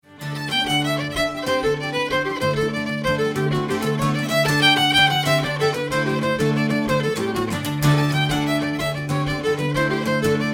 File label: Vega Mandolin